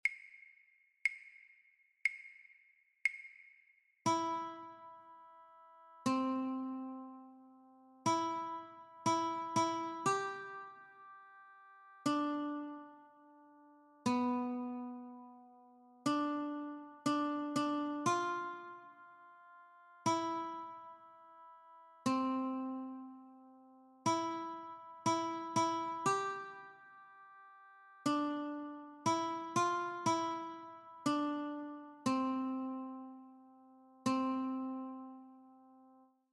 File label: Notes only, guitar; Skip to my Lou